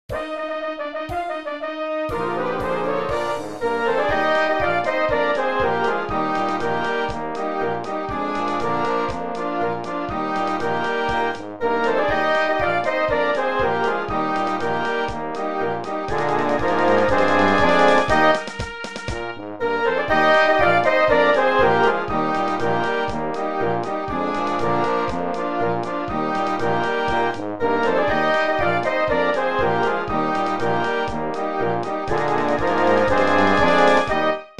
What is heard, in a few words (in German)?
Marsch